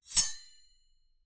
satisfying sword slice wi

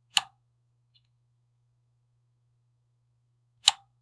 press the power button, activation

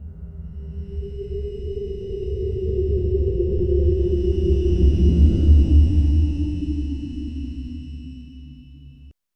dark suspenseful soundsca

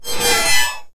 magical diamond blings

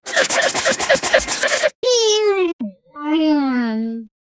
relaxing opening for game, game intro

